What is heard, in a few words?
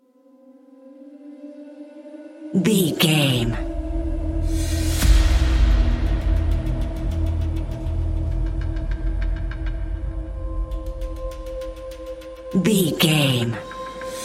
thriller action trailer